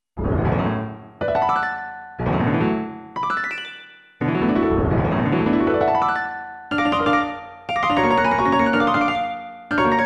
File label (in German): klavier; Algorithmische Kompositionen